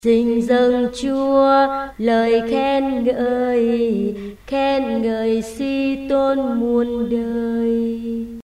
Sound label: alto basso